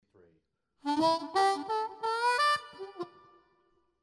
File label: Long plate reverb